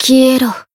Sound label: attack jp; suzette